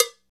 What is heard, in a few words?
PRC ETHNIC PERC; Roland - LA Composer vol. 1